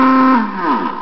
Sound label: lofi klaxon high low, Electronic Hits